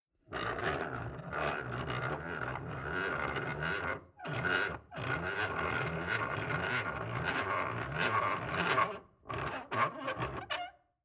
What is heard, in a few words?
Industrial Old Vice Closed Wound In Metal Scraping Rattling Mechanical; Scrape Sound Effects